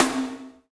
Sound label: SNR, Snares; Roland L-CD701; Sn Modules 6